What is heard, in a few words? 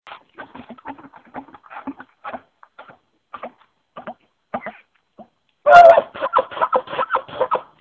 glunk chuckle